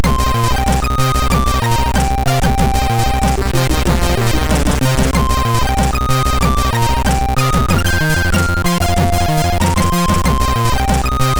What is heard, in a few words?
MOS6581; Demogame Example